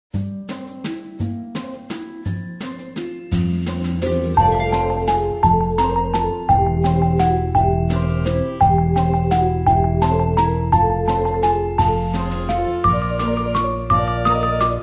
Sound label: BGM